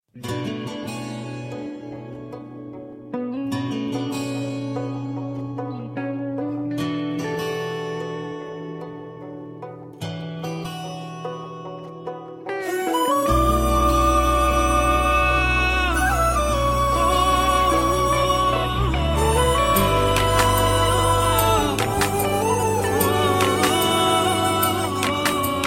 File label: guitar instrumental; ringtone